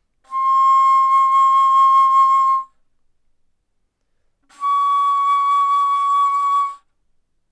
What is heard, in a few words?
Bass Flute C6 Db6; ff